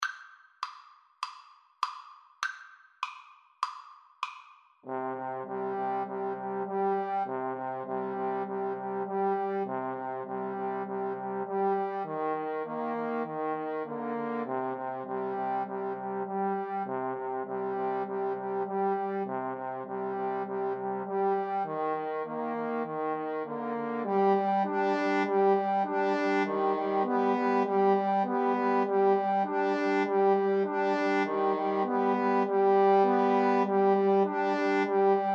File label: trombone trio